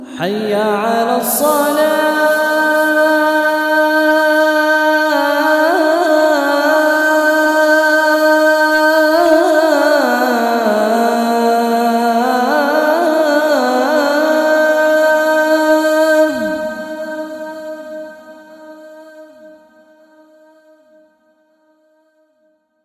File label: Call to Prayer